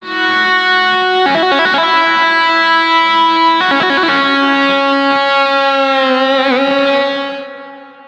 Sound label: guitar, stringed instruments; Sonic Foundry (Sony Creative Software)